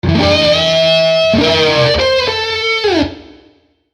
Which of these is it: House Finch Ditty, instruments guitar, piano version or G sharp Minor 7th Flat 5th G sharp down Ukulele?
instruments guitar